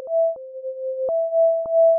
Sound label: melody